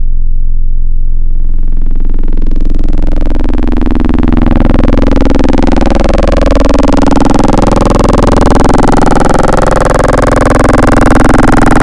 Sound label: Tri PWM Trench Mod; Synthetic's Serum Collection; Cymatics - Nuclear for Xfer Serum Wavetables